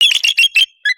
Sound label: Purr; creatures pets